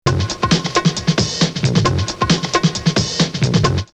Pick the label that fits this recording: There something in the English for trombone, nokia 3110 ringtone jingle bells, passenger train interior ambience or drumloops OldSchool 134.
drumloops OldSchool 134